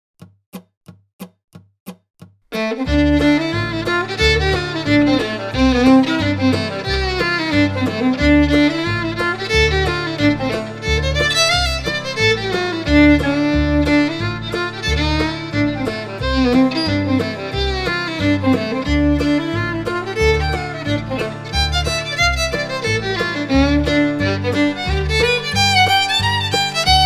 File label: Fiddle Blues